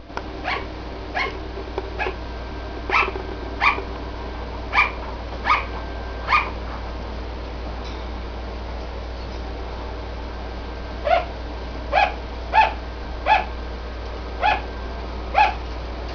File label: gliders barking